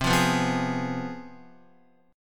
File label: C Major 7th Suspended 4th Sharp 5th, down, Guitar; CM7sus4#5 strummed; JGuitar